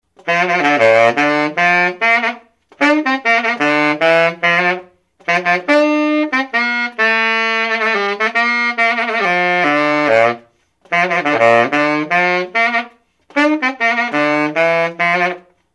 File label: Conn C Straight Gold SS B C Key